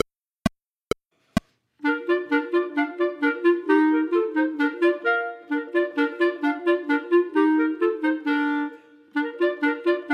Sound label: Ecossaise, Chamber Music for 3 Clarinets, minus 3rd part; Hummel